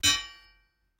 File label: large sword hit; soundscrate